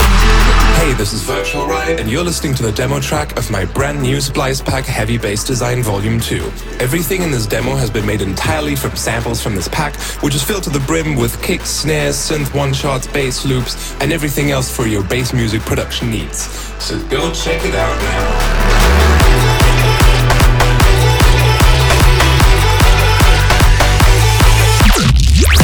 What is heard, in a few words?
Heavy Bass Design